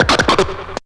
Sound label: Spit; Jungle Warfare 1&2